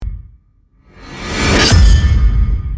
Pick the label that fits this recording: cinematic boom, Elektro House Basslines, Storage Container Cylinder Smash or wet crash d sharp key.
cinematic boom